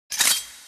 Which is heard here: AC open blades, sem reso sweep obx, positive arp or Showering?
AC open blades